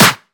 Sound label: clap single shot f key, Royality free clap tuned to the F note. Loudest frequency: 4112Hz; single hits one shots